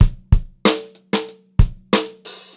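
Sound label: STRAIGHT BEAT, Funk-Groove Drumloops; USB Soundscan vol.38; AKAI; 90 s Sample CDs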